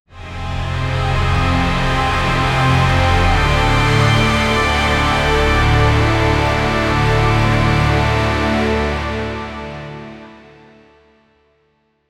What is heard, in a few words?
braam cinematic epic